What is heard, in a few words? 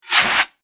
Emergency Fire Brigade Fire Extinguisher Foam Light Single Blast Squirt Flame Burn; Machinery Sound Effects